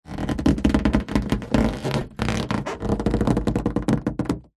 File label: balloon inflated rub movement creak